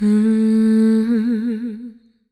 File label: Vocal Ad Lib - A Min; Cymatics; Light Free Sample Pack; Bonus Samples; Vocals